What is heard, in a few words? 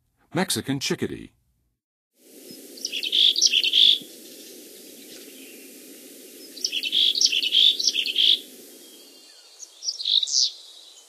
Mexican Chickadee, Songs of Birds; North America; Western USA; Western USA Songbirds